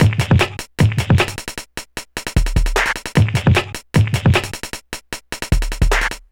drums, Hardcore Breakbeat 152 Dmin; Big Fish Audio; Hardcore Breakbeat Action; Gone Insane

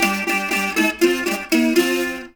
JAKAY; Spectrasonics; Heart of Asia

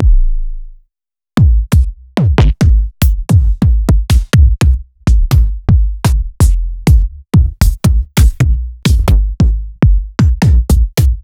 Bassdrums; Sliced

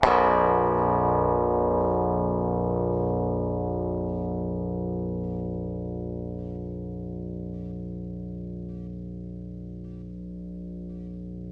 GTR ELGTR, Clean E.Gtr, Velo Chord; Roland L-CDX-01

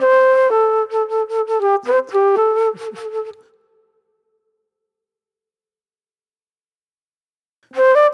Sweet Soul Flute

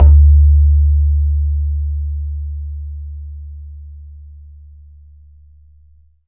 BAYAN; Q Up Arts; Denny Jaeger Private Collection

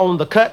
VOX SHORTS; Megabass Remix! 2

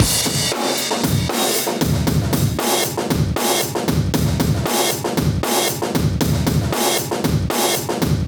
Live Breaks, 116

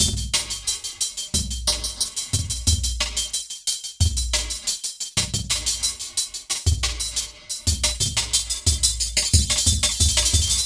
squarechops 090bpm, downbeat dance grooves; Zero-G

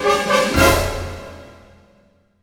Orchestral Loops

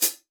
foot; Northstar - New Gold VOL-2; 30S BRUSH

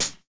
LOFI HH, JUNGLE KIT; Drums In Your FACE!

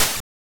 Open hat; Genesis

